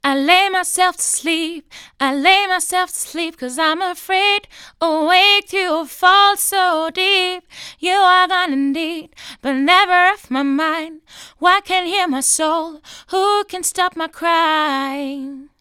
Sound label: fabfilter pro-r female vocals dry